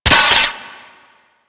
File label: Hand Rocket Launcher Mech A; Crp Hand Rocket Launcher